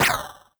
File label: standard shot long